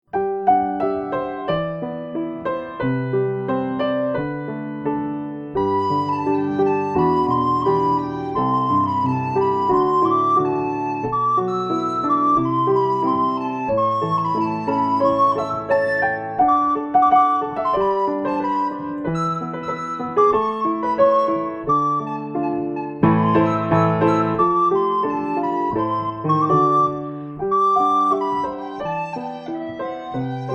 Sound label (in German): Sopranblockflöte mit Klavierbegleitung